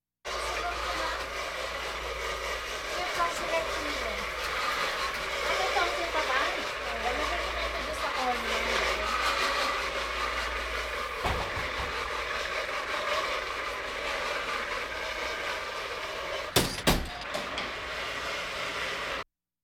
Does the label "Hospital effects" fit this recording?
Yes